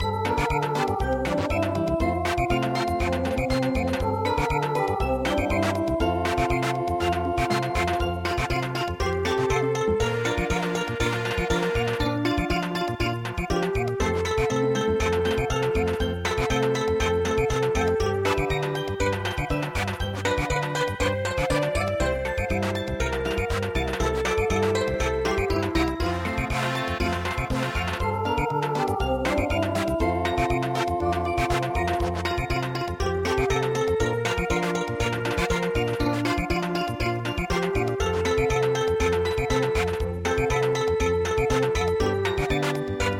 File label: Samba, midi; HEHA WAIPI O